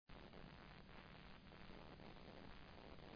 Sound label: audio blank